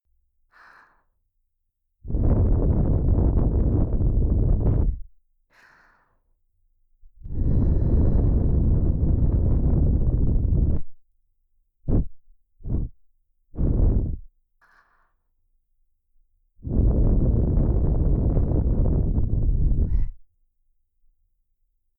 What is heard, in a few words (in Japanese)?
すーぱー耳舐めタイム【耳ふー・キス・耳舐め・両耳なめ】; JKリフレ『体験裏オプセクハラ研修』～れろれろ耳舐め特別指導♪～